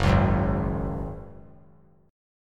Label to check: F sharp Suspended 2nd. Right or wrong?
Right